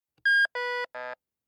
Metal Detector Switched On Start Up Beeps Electronic; Miscellaneous Electronic Sound Effects